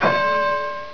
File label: boing